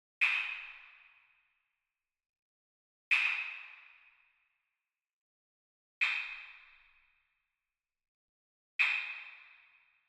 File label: Wood Percussion Wood Block Japanese Double Far; boom library; Cinematic Strikes Construction Kit